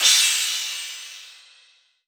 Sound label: Crash, Cymbals